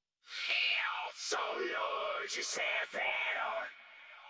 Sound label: Backing Vocals